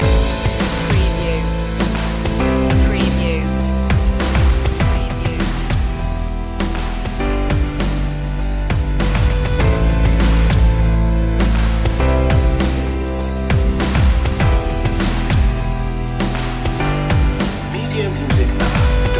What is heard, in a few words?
electro slam loop